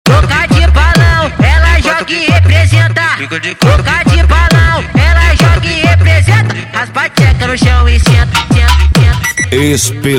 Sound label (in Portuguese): especial funk bass